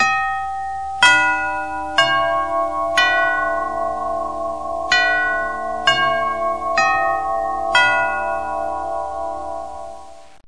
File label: grandfather clock